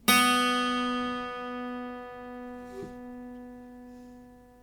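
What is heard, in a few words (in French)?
Electroacoustique si, guitare électro acoustique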